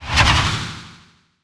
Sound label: suit charge ok